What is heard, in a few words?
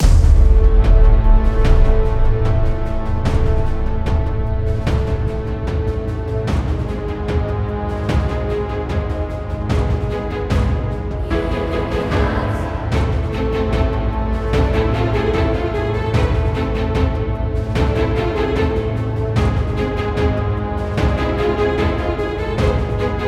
Boss Loop; Last Encounter